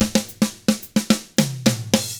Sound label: 110 SAMBA, Drums; ILIO; Double Platinum Drums 1